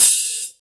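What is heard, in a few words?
hi-hat, Hard & Loud Techno; USB Soundscan vol.01; AKAI; HH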